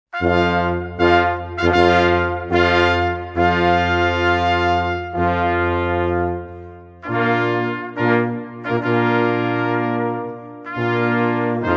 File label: brass bridal chorus